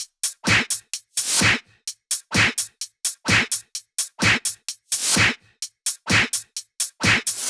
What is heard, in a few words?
128BPM Flatbeat Kit